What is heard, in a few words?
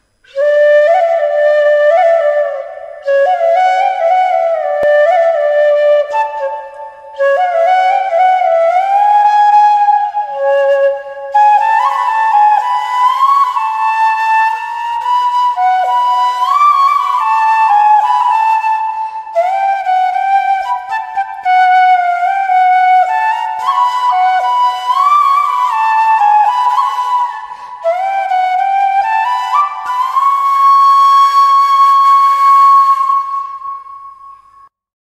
flute bgm